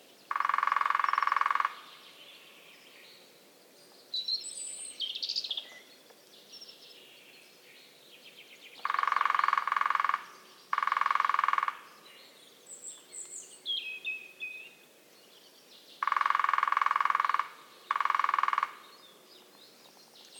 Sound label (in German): Kleinspecht; xenocanto; Bram Vogels; Belgien